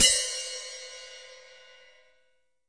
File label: Hat; Khezie Hip Hop Kit